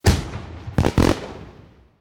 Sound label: petard